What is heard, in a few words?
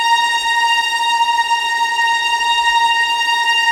tremolo; Level4 Hidden World